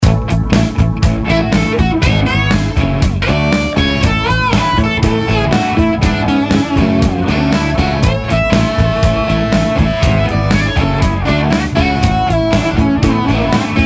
A Ionian Derivative, backing tracks, rhythm tracks, jam tracks, modes